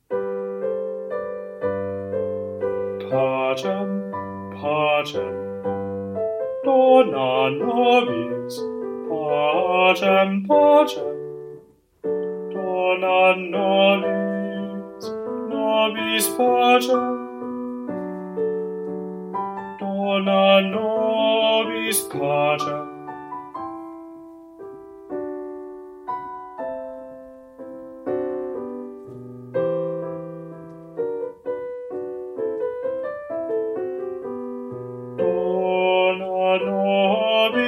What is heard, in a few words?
Bass; Dona nobis